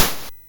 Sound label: Mini Golf, MOS6581R4